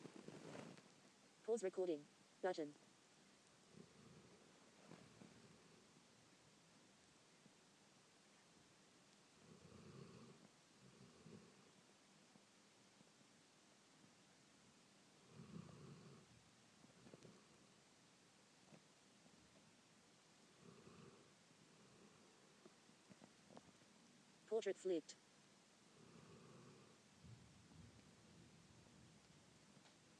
snoring

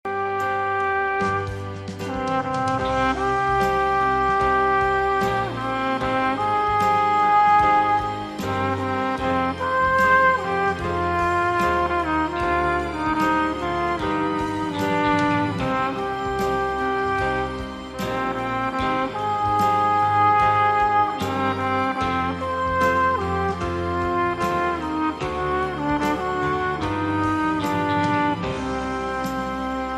timbre test

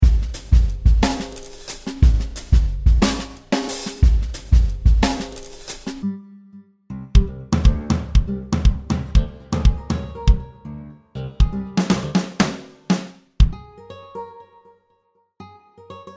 pentatonic tube

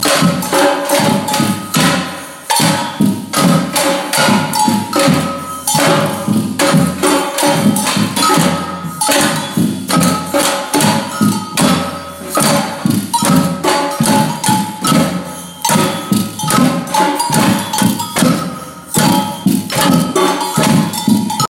Year 3 samba

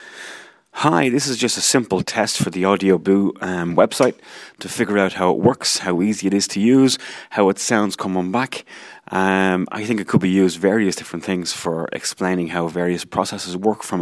heartbeat simple test

